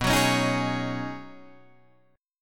B 6th Add 9th B Guitar Open D, chord

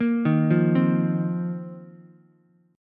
A sharp Suspended 2nd Suspended 4th, Ukulele; arpeggiated; JGuitar

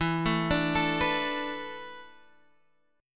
E 7th Suspended 4th; E7sus4; arpeggiated; x,7,7,7,10,7

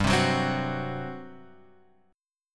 G Major 7th Suspended 4th, G, down; GM7sus4 strummed

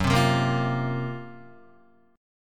Gb Major 7th Flat 5th Guitar; GbM7b5 strummed; JGuitar

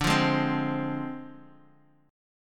D Minor 7th Flat 5th Bass Standard

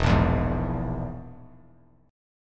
E Major Flat 5th; strummed; JGuitar

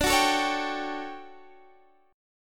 D Major 11th Guitar Standard, chord; strummed

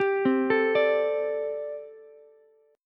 A Minor 11th A Ukulele C6, Chord; Am11 arpeggiated; JGuitar

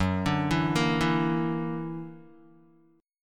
Gb Augmented 7th Gb down Guitar 4E,4A,5D,5G,5C,6F; Gb+7 arpeggiated

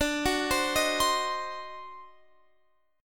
D 7th Flat 9th D down Guitar Open F; D7b9; arpeggiated

